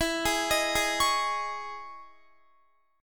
E Major 13th E, Guitar; arpeggiated; chordshape

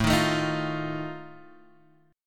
Eb Suspended 2nd Flat 5th, A

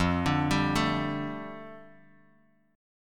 F 6th Add 9th, Bass Standard, F6add9 chord; arpeggiated; 13,17,17,14; JGuitar

